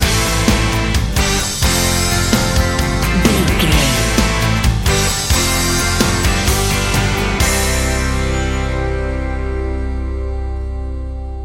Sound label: take charge your day bumper, Pop Country, Country, Rock, Country Rock, Bluegrass, Folk, Blues, Roots, Happy, Uplifting; powerful, intense, driving